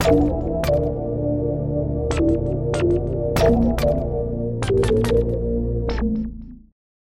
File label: ATMOS Stroboscopic Waves; Synthelium